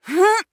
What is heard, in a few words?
Grunt Fast; Realm Royale; Mage; Kunoichi